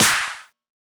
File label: Clap; Zero-G Trance Inducer; 90 s Sample CDs